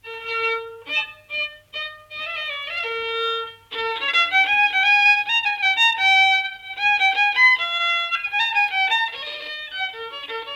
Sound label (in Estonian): viiul folk; Ruhnu; Ett tu tre; Elias Schonberg; uks kaks kolm